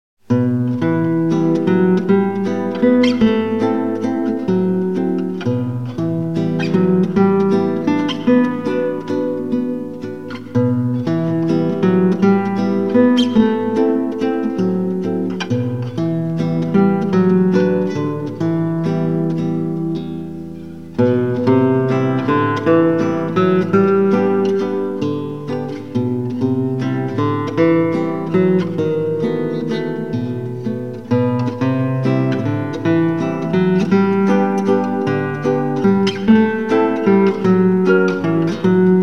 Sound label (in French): prelude en mi mineur